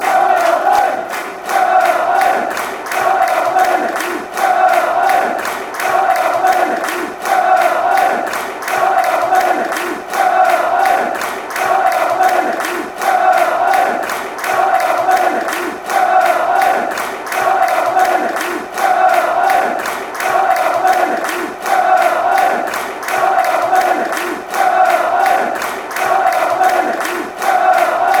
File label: barmy army